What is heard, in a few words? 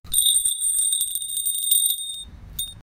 gold coins